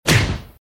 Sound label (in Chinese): 踢人声